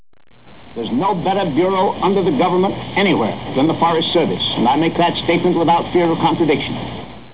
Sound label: 8 bit